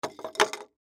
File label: Nunchaku Set Down On Wood; Warfare; Miscellaneous Combat; Blastwave